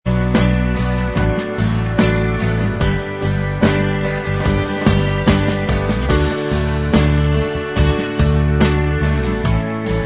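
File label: Instrumental Track, backing tracks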